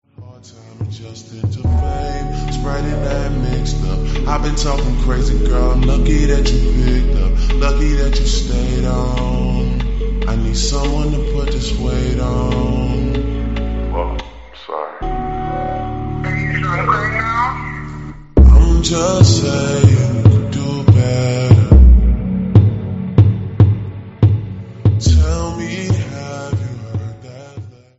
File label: slowed reverb